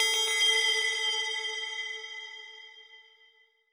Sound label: new tone